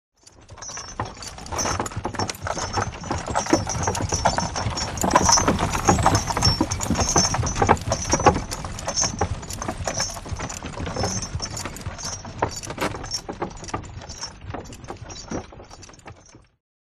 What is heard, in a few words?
By Horse Cart Up Past